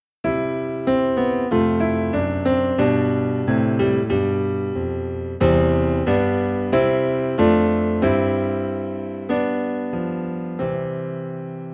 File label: Piano Low in C